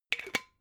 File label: glass bottle massive close cap short